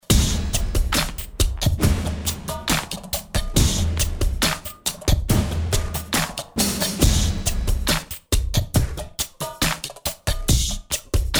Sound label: Root Rhythm